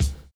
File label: STONESNAR; Univers Sons